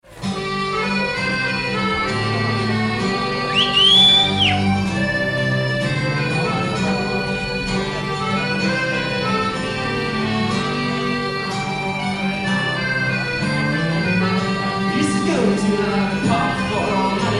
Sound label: Lullaby; Drunken Mans Lullaby